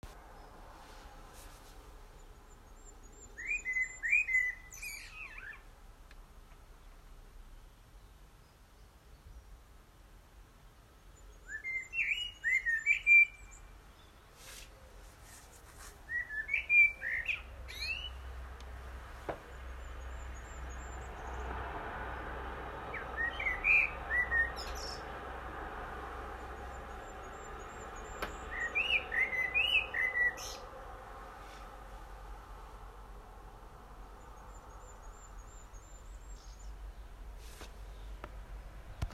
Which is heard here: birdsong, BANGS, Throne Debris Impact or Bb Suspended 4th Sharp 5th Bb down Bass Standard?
birdsong